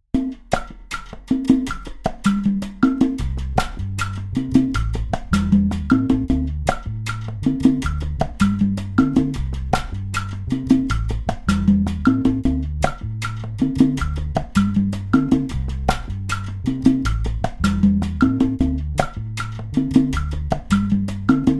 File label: Guaracha